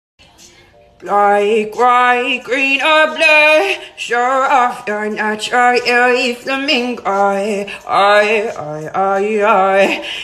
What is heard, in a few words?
Guy singing cursive; meme